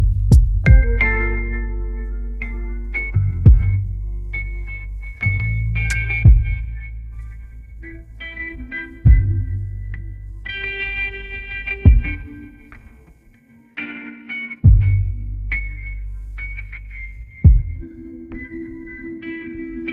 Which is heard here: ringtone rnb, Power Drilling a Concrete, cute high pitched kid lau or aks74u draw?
ringtone rnb